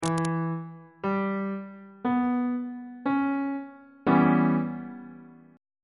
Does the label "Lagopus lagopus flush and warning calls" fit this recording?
No